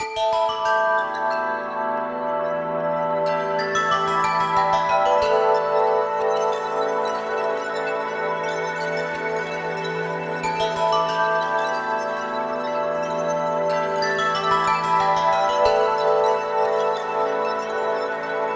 Ice Cave Loop; Murasama; Android

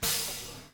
Door Pneuma Up Gas Release

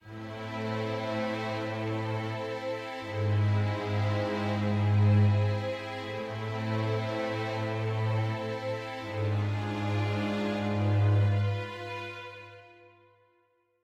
sad forte start

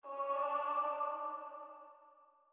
Chime; chime sharp

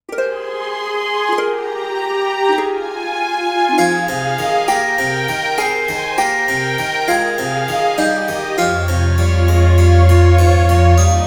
Game Over; Secrets of Grindea; Universal Music

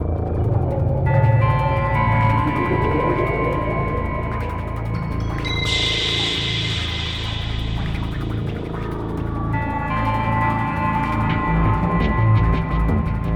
Mine

